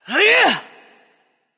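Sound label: grunt attack, female; smite; voicepack; hera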